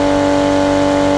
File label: Rolls Royce Cullinan High